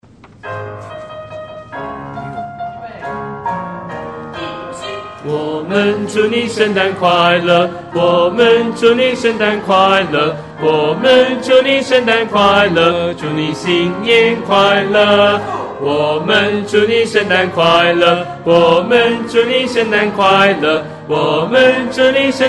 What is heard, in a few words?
bass